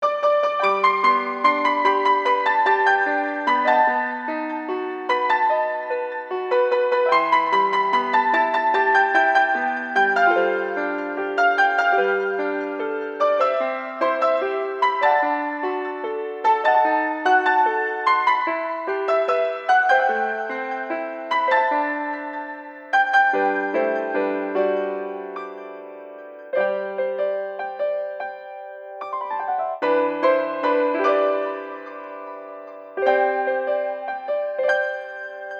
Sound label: Piano